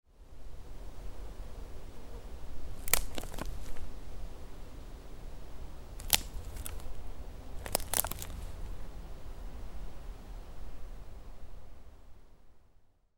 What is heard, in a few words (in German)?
Knacken im Wald